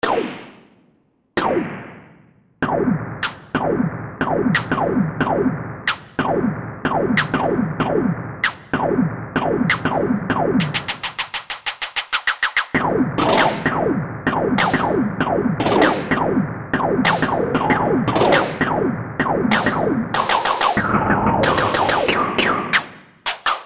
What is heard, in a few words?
Perky Noize, Roland JV-2080